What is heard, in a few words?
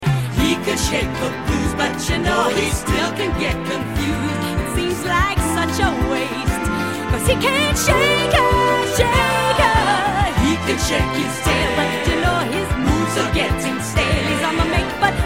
Shaker